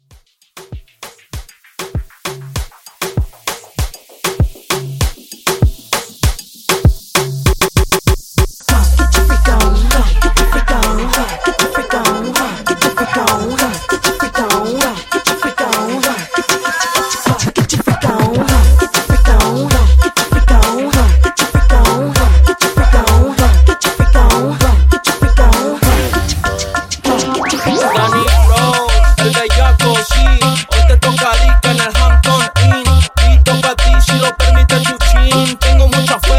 Reggaeton 98

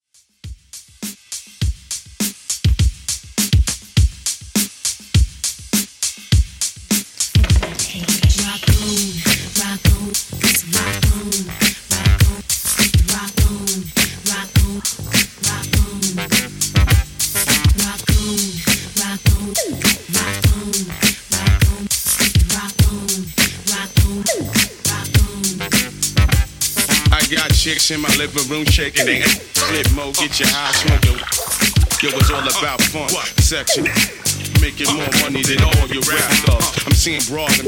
Old School Redrum 102 Bpm Clean

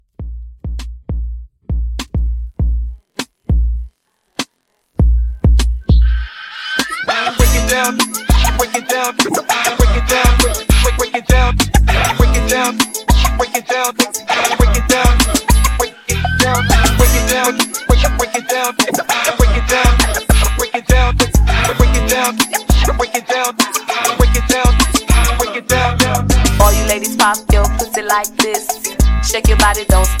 Hype Scratch

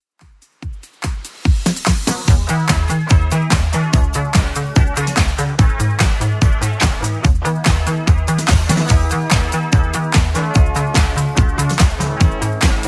Calling All Boys 80s Club 145 bpm

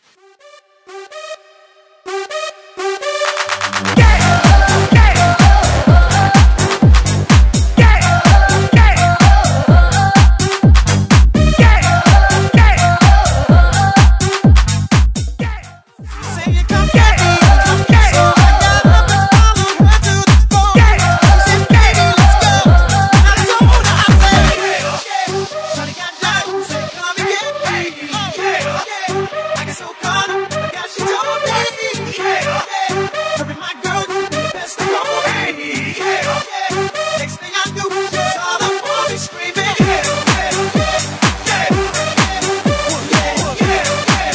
House Mixshow

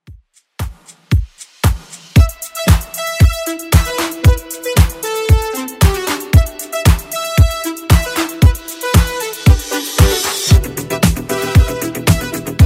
80s Redrum 115bpm

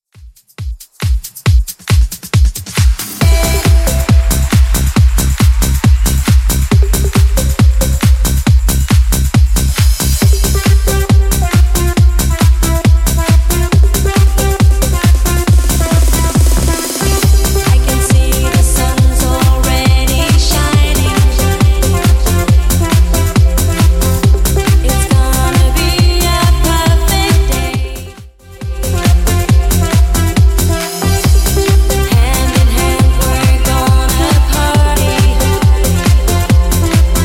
90s Redrum 137bpm

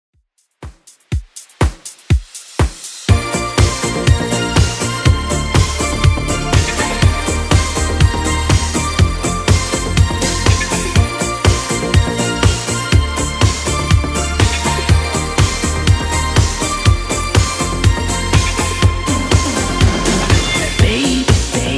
80s Redrum 122bpm

